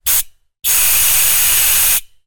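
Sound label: industrial gas canister air release short then med burst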